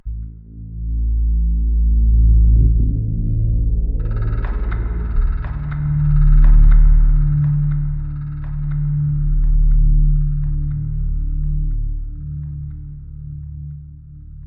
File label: logo begin